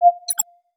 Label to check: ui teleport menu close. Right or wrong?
Right